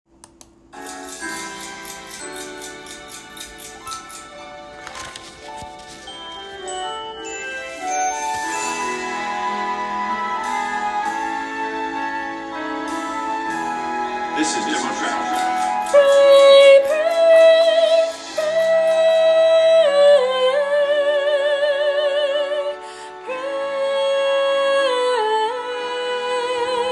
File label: Soprano; Fame The Musical - Holiday Pack